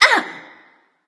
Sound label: clear throat; Smite; athena